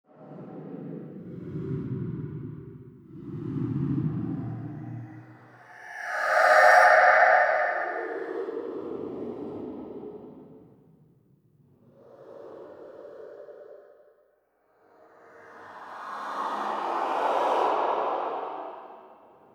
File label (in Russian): Prizraki privedeniya